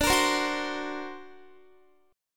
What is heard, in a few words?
D Augmented 7th D down Guitar; D+7 strummed; tuning 4E,4A,5D,5G,5C,6F